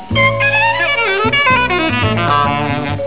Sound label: alto